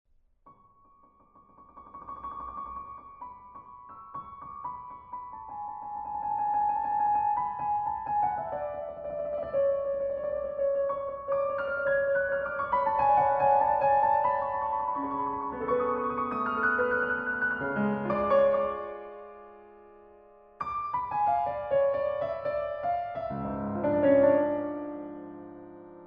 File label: Piano